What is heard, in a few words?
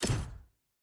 UI Menu Generic Click